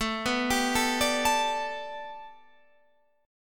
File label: A 7th Suspended 2nd Suspended 4th Guitar Standard; A7sus2sus4 arpeggiated